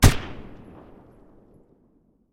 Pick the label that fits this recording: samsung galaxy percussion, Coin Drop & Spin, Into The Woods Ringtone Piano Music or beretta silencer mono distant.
beretta silencer mono distant